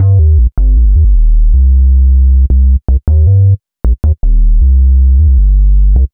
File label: Synth Bass